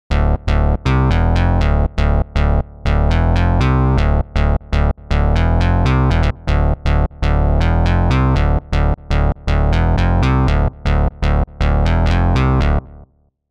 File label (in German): ein weiterer bass; bx oberhausen